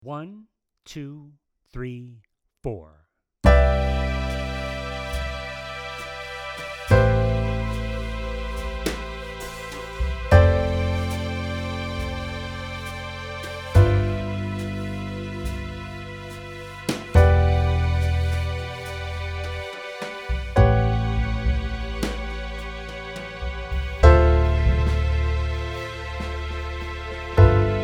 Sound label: Easy progression